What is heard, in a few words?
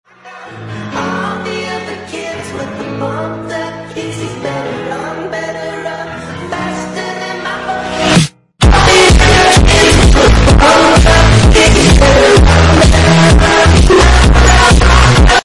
earrape